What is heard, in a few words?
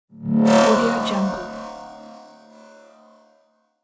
buzzer